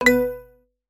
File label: app alert tone